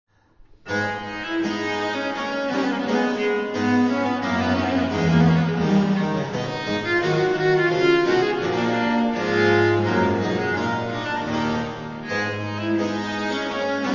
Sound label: Gavotte; Marais; gout francais